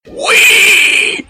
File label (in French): cochon en rut bass boosted